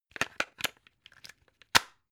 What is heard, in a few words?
plug plug in clatter long